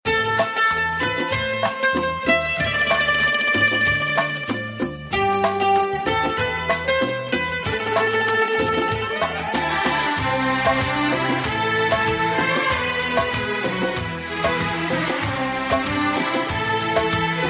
soft instrument; classical

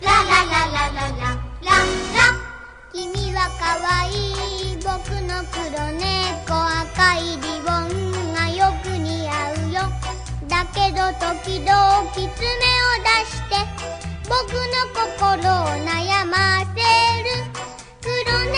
japanes kid song

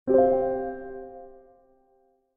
Soothing sound

